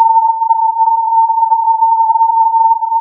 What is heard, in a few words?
filtered input